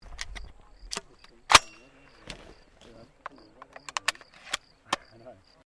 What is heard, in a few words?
SHOTGUN 12 GAUGE PUMP ACTION LOAD, Sporting Shotguns